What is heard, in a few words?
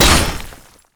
anchorchain terrain; nautilus